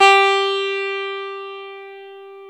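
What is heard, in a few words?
E 12 String guitar; Club-50 - Foundations Roland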